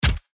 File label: spin start